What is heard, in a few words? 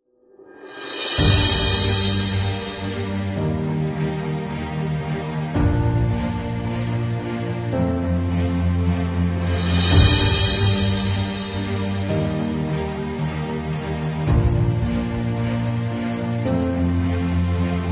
Epic